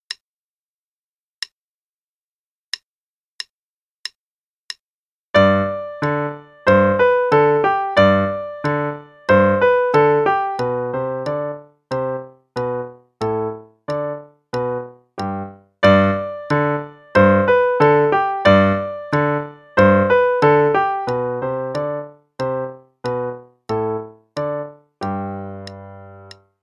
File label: Musette, qn=92; Adult Level 1